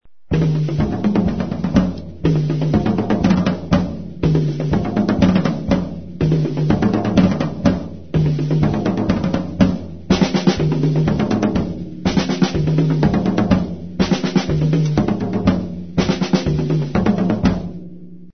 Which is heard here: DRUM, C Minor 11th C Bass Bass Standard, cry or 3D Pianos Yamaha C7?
DRUM